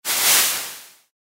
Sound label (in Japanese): 薬草